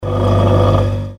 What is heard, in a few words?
freezer